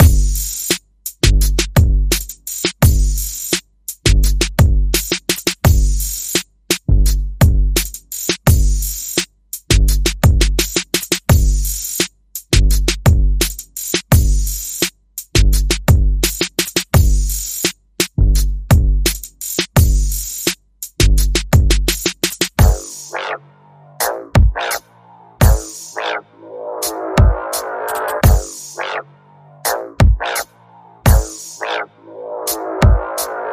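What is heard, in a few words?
Bass and Percussion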